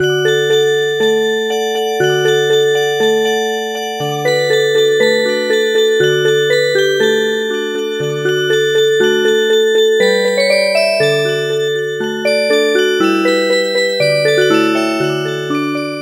Music Box

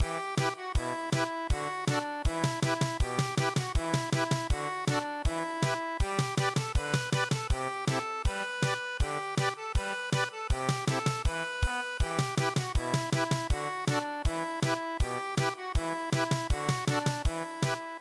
German Polka

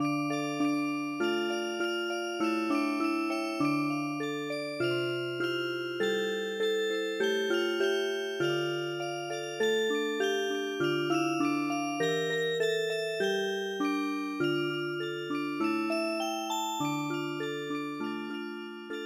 Music Box, traditional; Russian Winter Night

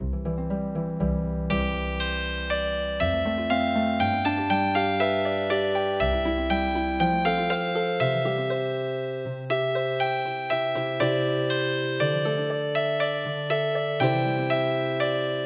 Healing Harp, traditional

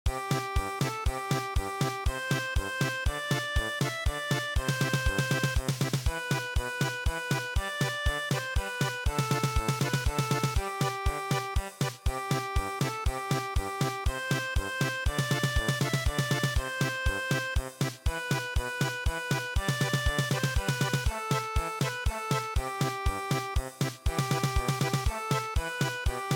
German Polka

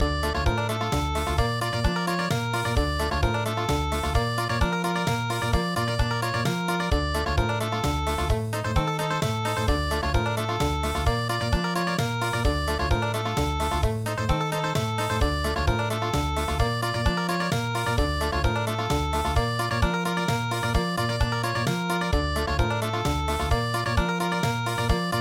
Country; EiAman

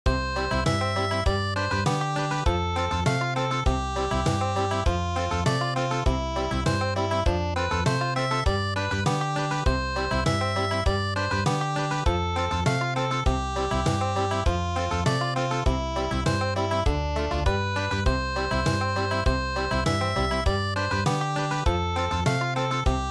GAVOTTE Country

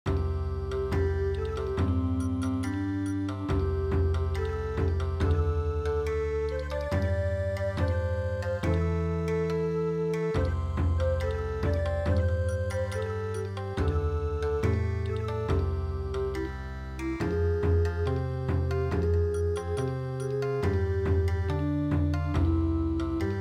Bon Odori, midi

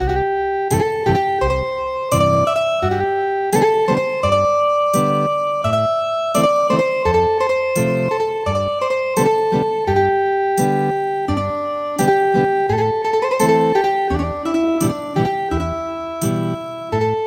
Ethnic Guitar

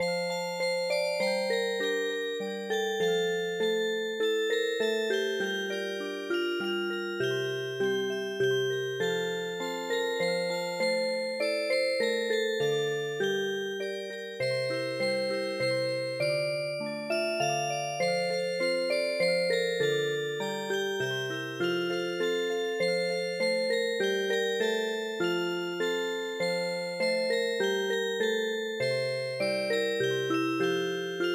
Music Box, midi; ドイツ民謡の「いとしきみと」に癒し系のアレンジを施したオルゴール曲を聞くことができます。; 「いとしきみと」は、ミディアムテンポな3拍子のドイツの伝統曲です。

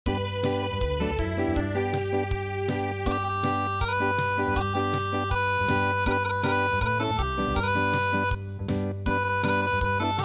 Acoustic, midi

